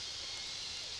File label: Background Noise